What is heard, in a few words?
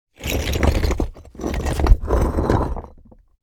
Asphalt concrete block dragged on bricks with debris